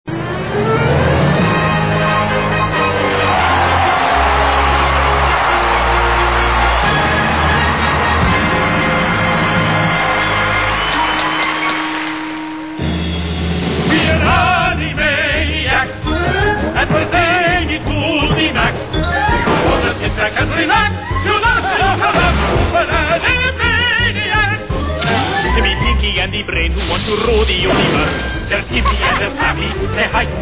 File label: 3 tenors